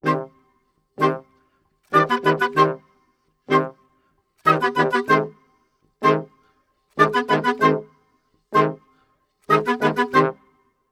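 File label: C min brass + woodwind, Orchestral arrangements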